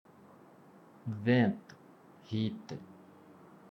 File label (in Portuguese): vento